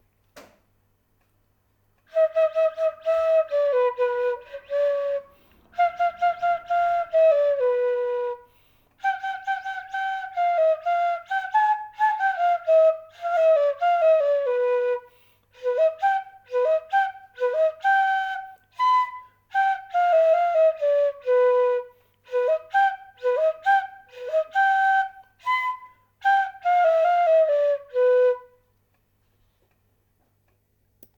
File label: flute